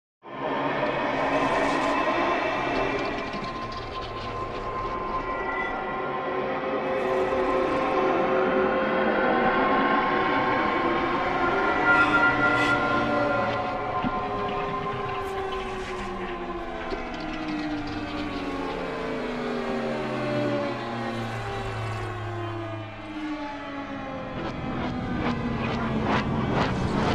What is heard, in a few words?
Cinematic Suspense Sound Effects; impact